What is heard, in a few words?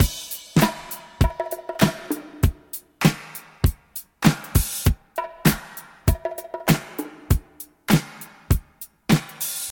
99 bpm drum groove G sharp key, kick tuned to the G# note